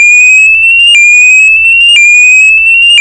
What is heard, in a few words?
tone